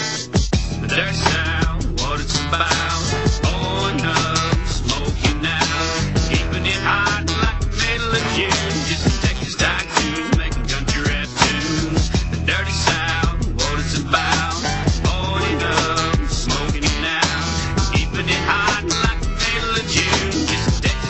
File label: Country Rap Tunes, ringtone